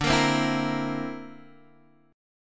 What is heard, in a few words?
D 7th Suspended 2nd Sharp 5th D, Guitar Open G